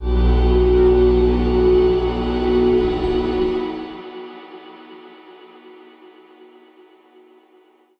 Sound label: Drone, Xtonal; XITHER